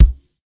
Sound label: mid heavy bass drum single shot d sharp key; kick